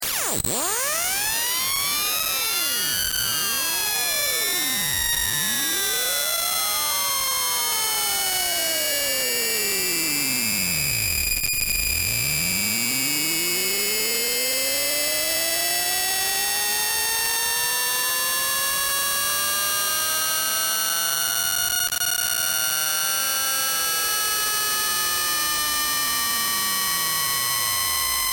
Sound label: Feedback Cycles Harsh, strange tone; Sound Design